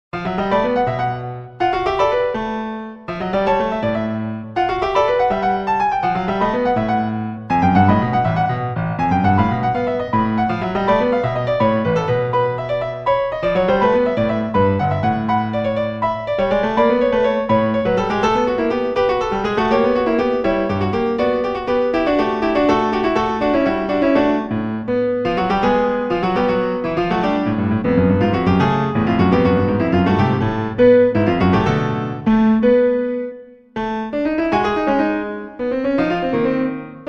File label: Piano Prelude